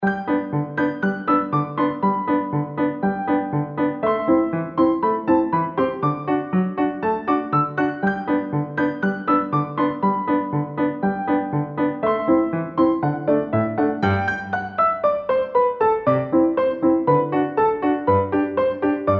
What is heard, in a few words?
kawaii piano